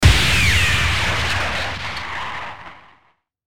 Hit Whoosh Bullet Time Distortion, Whoosh Robotic Tonal; Production Element Imaging Element Accent Transition; Blastwave